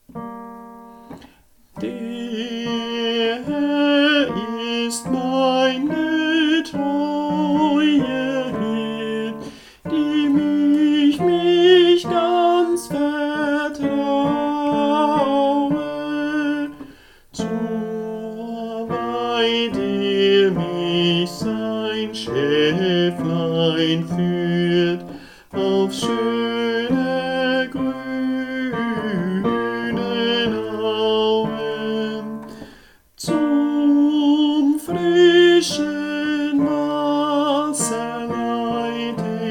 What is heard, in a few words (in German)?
Tenor